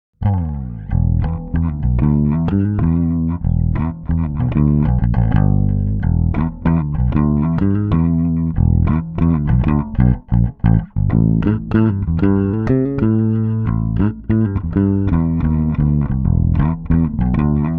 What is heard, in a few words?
Bridge Blues